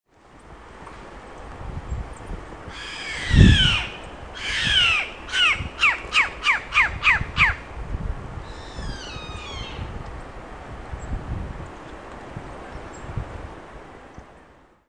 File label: chimango